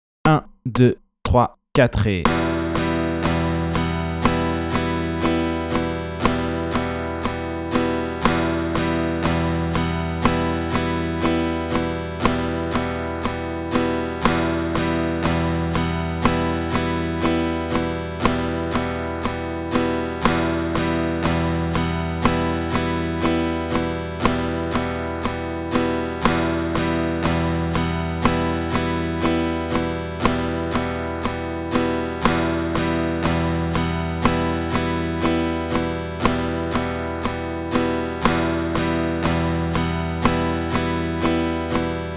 Flèche vers le bas 120

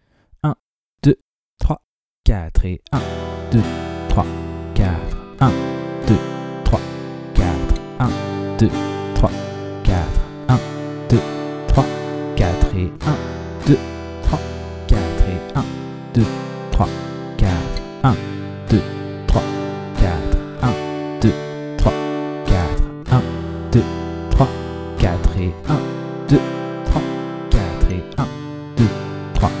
rapide travailler la nouvelle grille avec la première rythmique; De 0 à vos premières suites d'accords

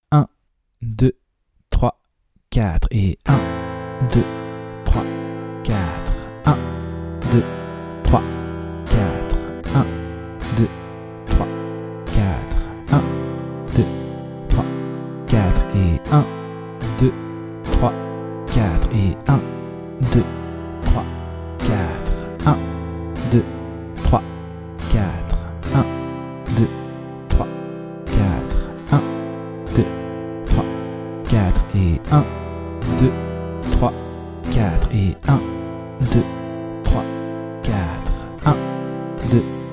moyen travailler la rythmique avec la grille; De 0 à vos premières suites d'accords

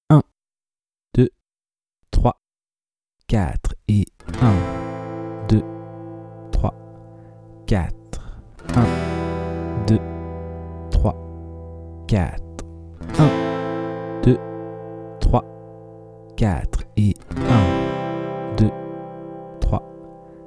lent Jouer la grille; De 0 à vos premières suites d'accords

